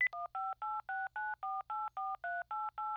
Phone Redial; AdobeCC

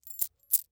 Coin Jingle In Hand Short; Foley

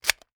Foley Toy Dart Gun Cocking

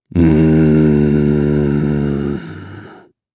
Horror Scary Ghost Moan Growl Ghost Moan

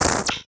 Flash Digital; Multimedia Internet CD-Rom Flash

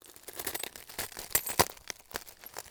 Crash Glass Sharp Grind Glass Cup On Glass Debris; Crashes

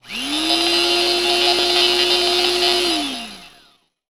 Industry Tool Drill Electric Drill

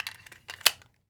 Weapon Gun Pistol 45 Caliber Clip Insertion; AdobeCC

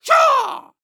Human Male Karate Yell

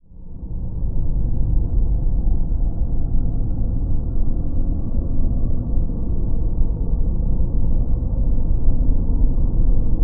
Drone Dark Horror Haunted Growling; Production Element Imaging; AdobeCC

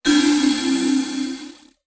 Multimedia Internet CD-Rom Flash Wipe

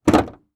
Transportation Jeep Commander Open Hood

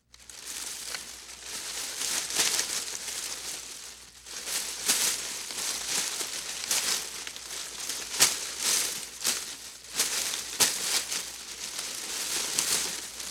Foley Footstep Human Walk Through Brush; Foley Footsteps

